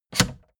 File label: fader plastic scrape single down halfway fast